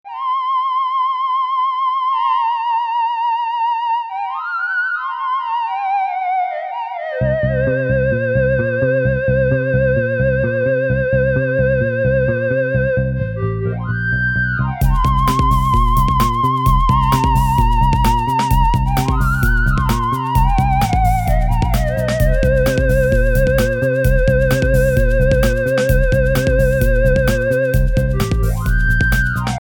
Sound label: jingle